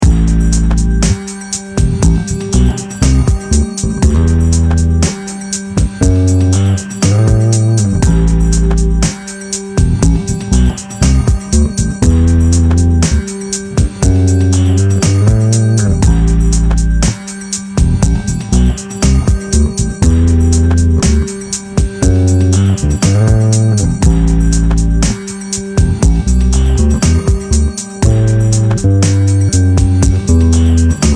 Dorian Groove, Here’s an example of a Dorian groove in A:; bass; a bassist's guide to modes